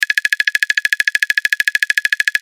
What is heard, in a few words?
score Count